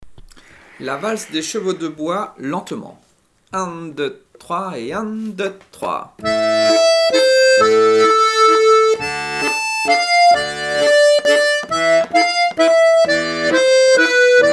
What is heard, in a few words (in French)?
valse lent